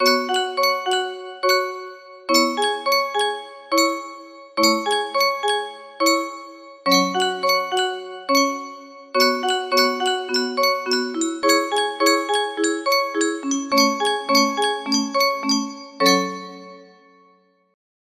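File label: music box melody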